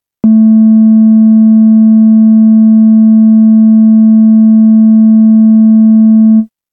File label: waveforms sine